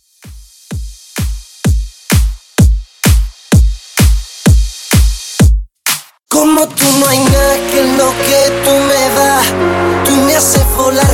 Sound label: Dance ReDrum, 128 Bpm